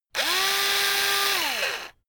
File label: electric drill screw in long; maschinen; bohrer; soundpool